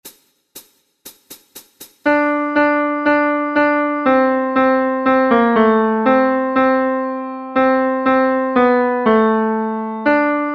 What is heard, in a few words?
Instru Tenor; Messe de Saint Jean Alleluia